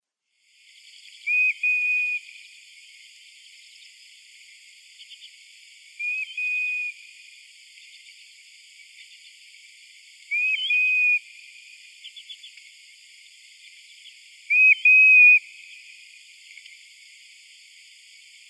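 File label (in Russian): Tules, grey plover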